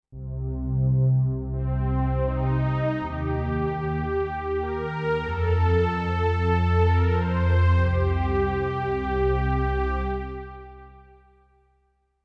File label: Saw Strings